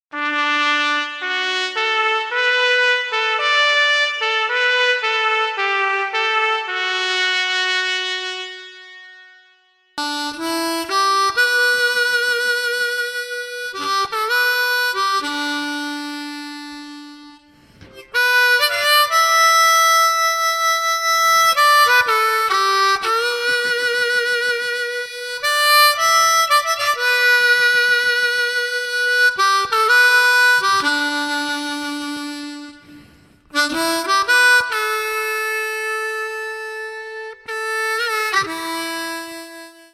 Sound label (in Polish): Uzupelniony hejnal Brodnicy